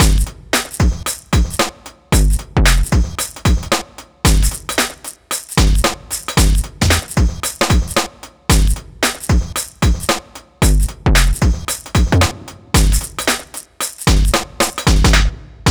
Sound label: Shuffle Beat